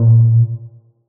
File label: Bass, One Shots; Touch Loops; BlissedOutBeats